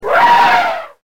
elephant

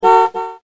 Car Horn

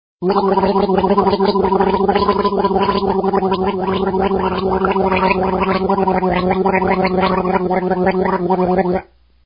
Gargle

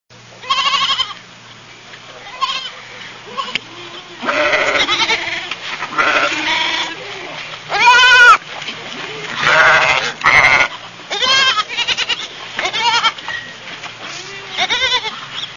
Sound Effect Sheep Baaa

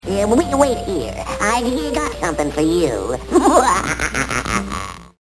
um wuaha

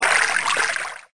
sink

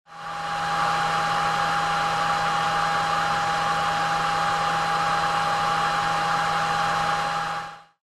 baby dryer

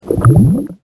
lava bubble

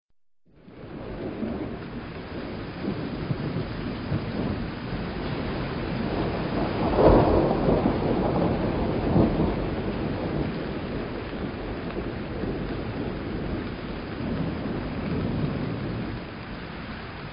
Thunder Storm

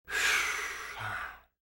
sigh

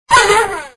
dog bark

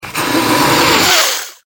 snake die

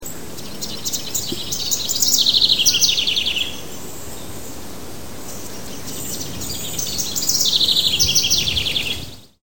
house wren

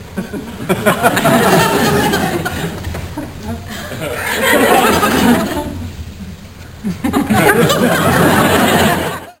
laugh

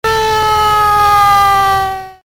Police Siren

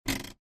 Car Parking Brake

